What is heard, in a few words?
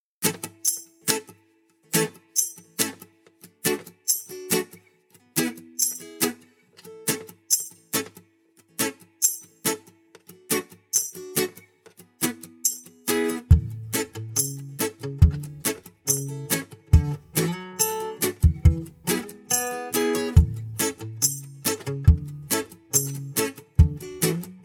acoustic